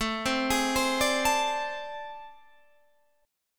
A Minor Major 7th Double Flat 5th, down, Guitar, Standard; arpeggiated; JGuitar